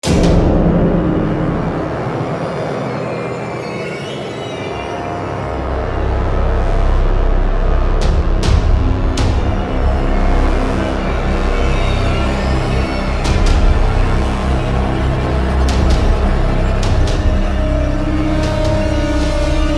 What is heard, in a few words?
Drill